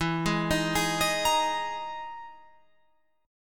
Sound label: E-Major Sharp 11th E, Guitar Open F; EM#11 arpeggiated